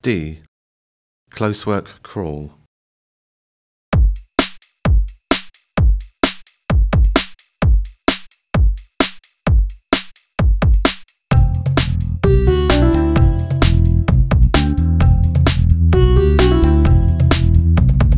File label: Close work Crawl